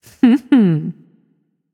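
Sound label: heh heh, female voice; smite; voicepack; hera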